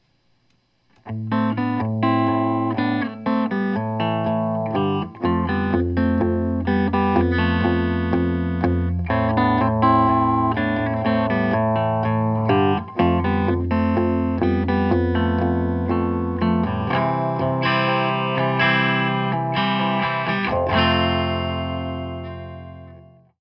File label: Rohr 15 1x12 Combo Gibson Les Paul